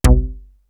BALZY BASS; East West - Global Sounds; PROC.BASS

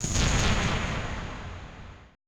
AMBI FX

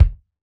Std. Kick; Roland BK-5

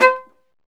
STR VLA, Viola Solo

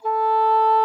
OBOE D#5, REEDS; Greytsounds VOL-1

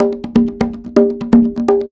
Percussion Loops, Bongo & Conga; Houseworx

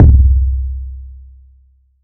Perc

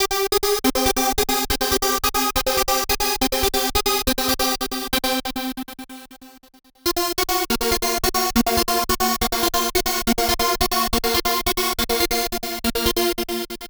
Progressive Synth, Trance

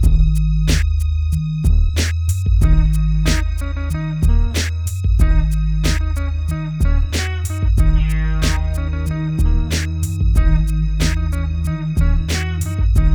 93BPM, hip hop; InVision Interactive; 40 Oz Phat Slammin

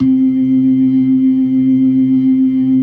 LO MANUAL VIB, Organ; InVision Interactive; Keith Emerson; The Most Dangerous Synth and Organ

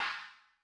china, Cymbal; Yamaha DTXpress-II

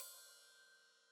Hat Open, Hi-Hat; Deeply Sampled Drums

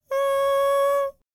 HUMAN TRPT, Jazz FX; Spectrasonics; Vocal Planet

